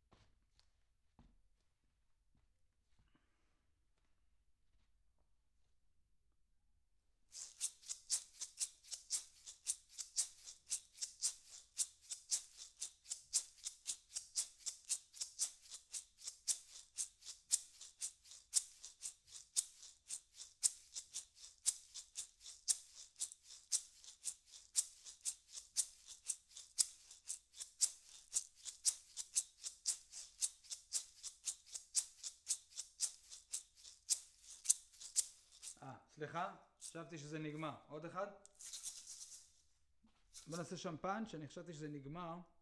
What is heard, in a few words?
PERC OH M